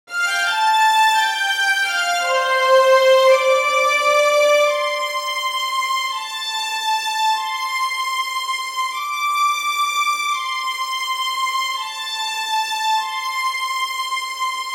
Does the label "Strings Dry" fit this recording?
Yes